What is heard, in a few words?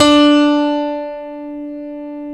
StereoGrand, Keyboards